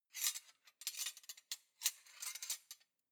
cutlery large multiple rattle

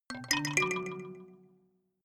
notification kalimba delay triad up minor low

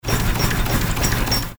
steam engine valve

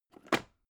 plastic bowl small close rattle

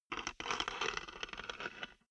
wood board scratch

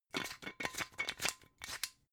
glass bottle massive open scratch

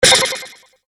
metallic tonal

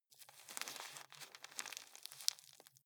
juggle squeeze

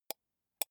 computer mouse wheel click slow soft

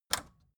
record player power click on fast

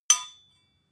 sword hit clack

